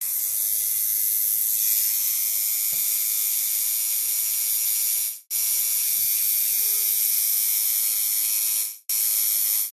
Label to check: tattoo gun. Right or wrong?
Right